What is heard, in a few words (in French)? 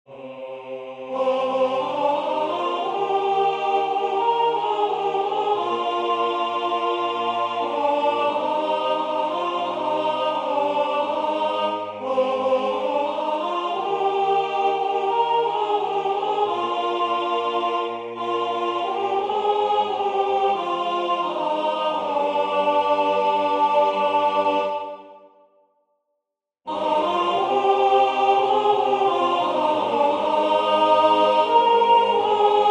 soprane; Regardez l humilite de Dieu